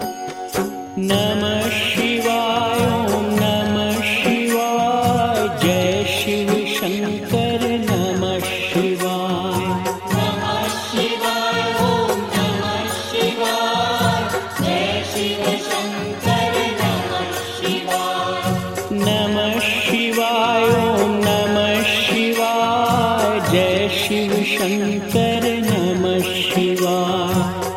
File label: om namah shivay